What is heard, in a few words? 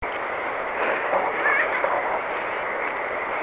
golden laugh talk